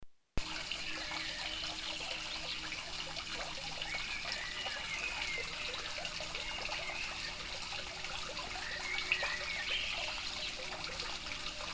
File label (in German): Tummelplatz